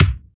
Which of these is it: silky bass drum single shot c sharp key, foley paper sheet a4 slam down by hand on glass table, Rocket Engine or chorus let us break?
silky bass drum single shot c sharp key